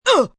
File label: Grunt Winded, Grunt, groan, impact, male, quick hit, pain, hurt (human voice); Groaning; Voice Box